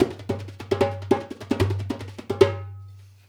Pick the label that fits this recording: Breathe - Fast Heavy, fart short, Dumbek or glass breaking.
Dumbek